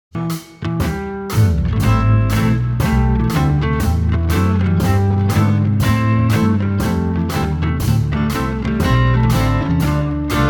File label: Am Shuffle, Drums